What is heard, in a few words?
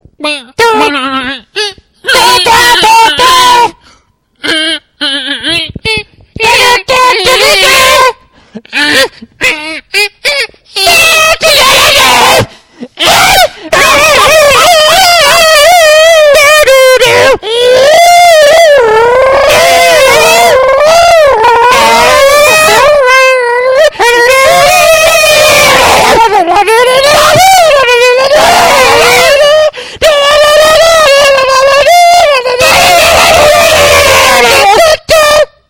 semi auto park music; automatic turban